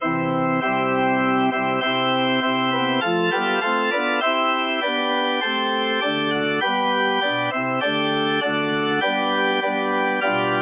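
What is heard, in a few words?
Navy Hymn ORG, organ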